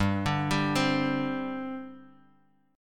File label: G Suspended 4th; Gsus4 arpeggiated; JGuitar